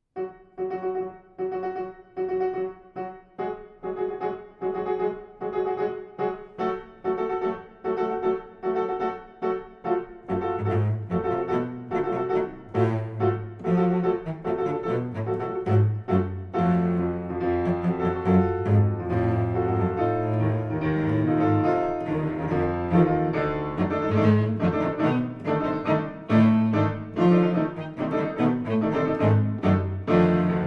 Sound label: Piano Quintet